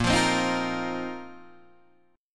A sharp Suspended 2nd Suspended 4th down Guitar DADGAD